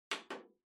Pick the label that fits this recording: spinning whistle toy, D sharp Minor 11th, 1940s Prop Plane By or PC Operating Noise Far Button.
PC Operating Noise Far Button